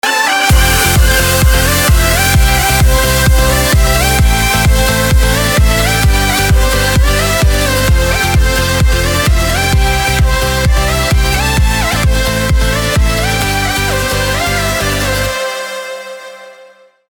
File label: piano